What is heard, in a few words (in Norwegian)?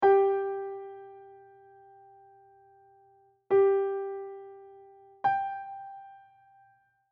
oktav